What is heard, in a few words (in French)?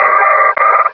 Cri